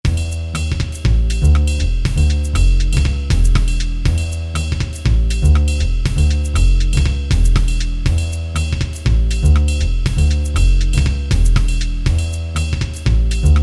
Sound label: Jazz